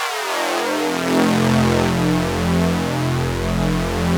SYN, SYN Analog, Roland; Sound & Vision - Gigapack I CD 2

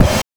BD + CRASH; NES DPCM; MIDINES

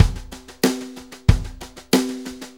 Brushes Loop, Full Kit Loops; Sonic Foundry (Sony Creative Software); Drum Tools

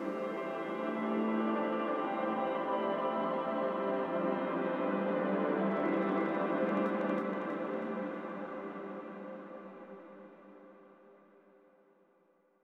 reverse piano granule cmaj

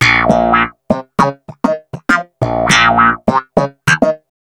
Wah Snap in Bb, Snap Bass; Basses

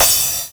CRASH, Drums Dance & Groove; USB Soundscan vol.11; AKAI

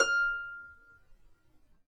F5 HARP; USB Soundscan vol.62; AKAI

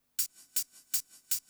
LOOP PC, 160 BPM; Ueberschall; Drum & Bass Flash Trackz

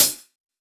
Closed Hihats; Cymbals; Vengeance - Essential Clubsounds Vol.5

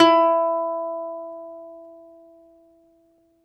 BALALAIKA, Prima Balalaika, Plucked Strings

